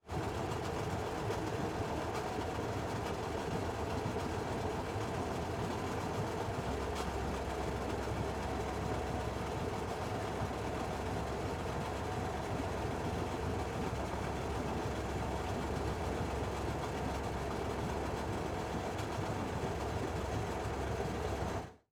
Autos Mustang Idle; Transportation; 77 Browning

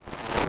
Science Fiction Sci-Fi Electronic Signal

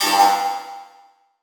Transition Hit Impact Ping

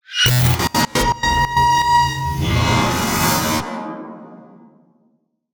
Choppers; SoundMorph; Bloody Nightmare; GDC Game Audio Bundle

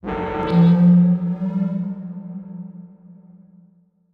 Transition Communication Distortion Scanner; Adobe; Production Element

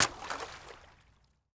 Impact Tree Branch Hitting A Puddle; Crashes

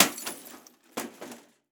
VCR Dropping On Glass Debris; Crashes

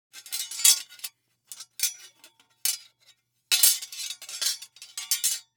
Foley Metal Small Metal Movements

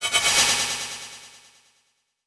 Title Transition Delay Short

